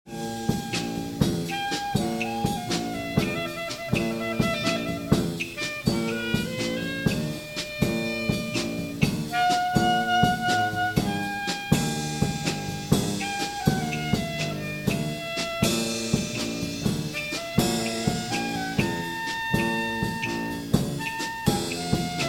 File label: rumba